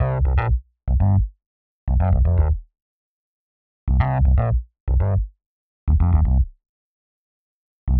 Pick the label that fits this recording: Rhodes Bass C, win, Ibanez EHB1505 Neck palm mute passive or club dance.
Rhodes Bass C